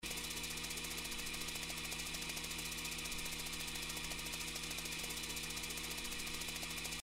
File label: reel spin; Cyber Angel